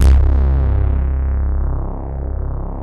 Bass Analog & Synth, C2; BIGBIG; USB Soundscan vol.12; AKAI; VINTAGE

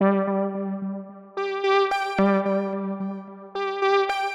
Synth 110BPM

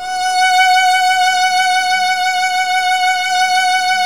Virtuoso Strings

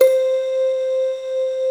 Glass Tamb; Best Service Gigapack 1

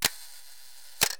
on off, Switch FX; Masterbits

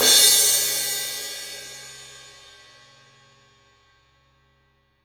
CYM, Real Drum Kit, FUNK KIT; REAL DRUMS

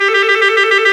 WND TRILL, Clarinet FX; Roland LCDP04 Orchestral Winds; 90 s Sample CDs; Clarinets

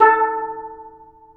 A3 TENOR, STEEL DRUMS; AKAI S6000 CD-ROM; WorldSounds

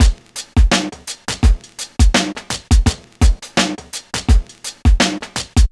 Drum Loop, Drum Loops

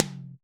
tom, Roland MC-909

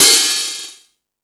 Percussion FX, Drum Machines

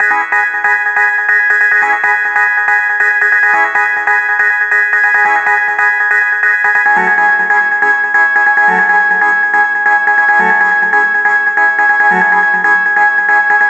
140BPM C#; Vengeance - Essential Clubsounds Vol.4

